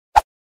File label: bamboo stick swing